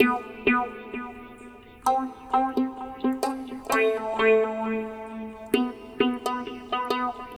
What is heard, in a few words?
NEW ROOTS REGGAE; Sonic Foundry (Sony Creative Software); Drums from the Big Room The Mixes; Demo Projects